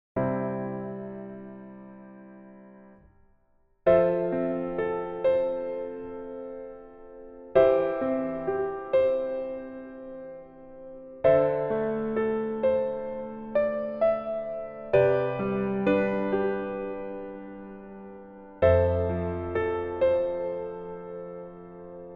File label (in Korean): Piano ver, -1키